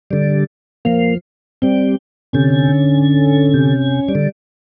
Two Men Blues

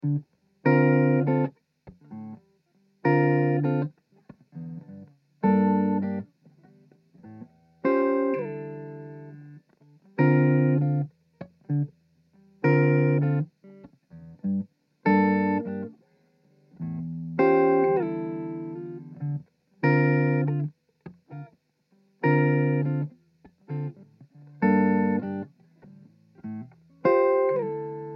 Dm100 GTR Jazzy Riff

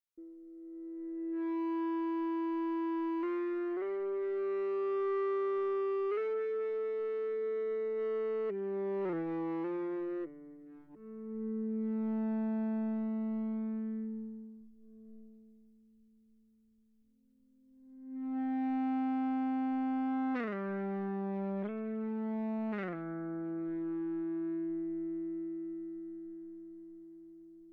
Ebow Ensemble; Mille Regretz

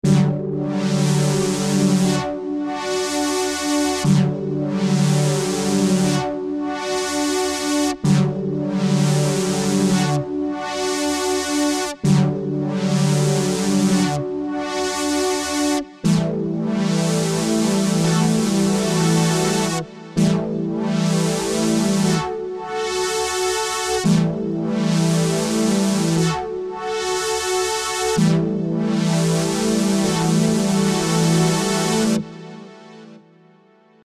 D 120 Yamaha CS80 chords

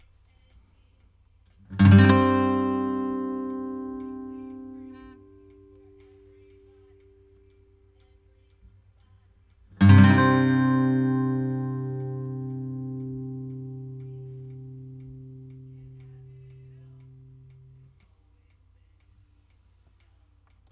acoustic guitar

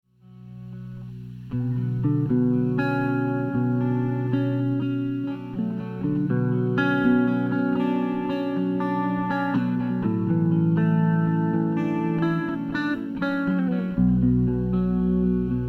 Ambience of Uncertainty

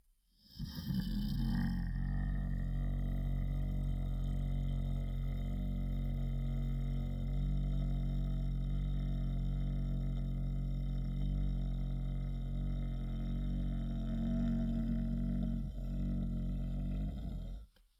Throat 90475hz loop

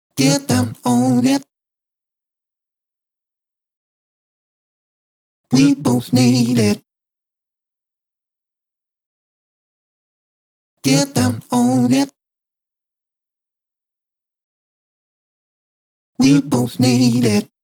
Sax

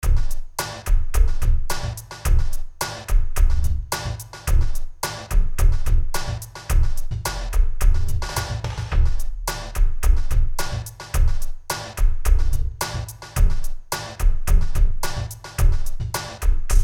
C#m108 Skip Funk Groove

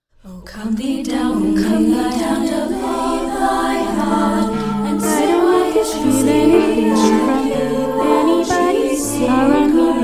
waltz

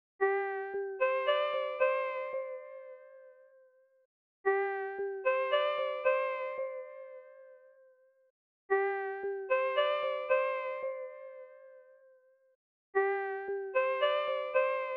lead 1

